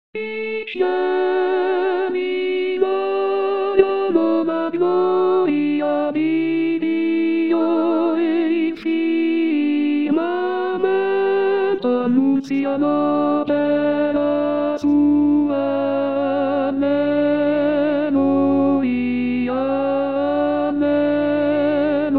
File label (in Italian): I cieli narrano contralti